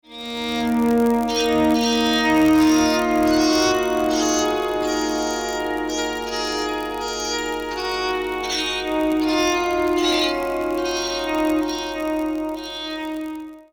quick bowed sample

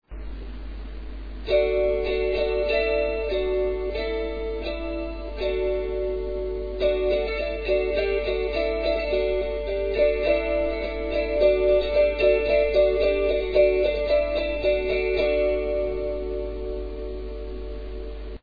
tune c

